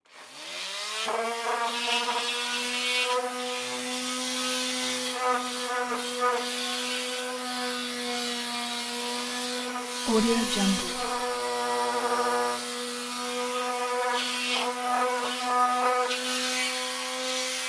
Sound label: industrial tool sheet sander on sand wood off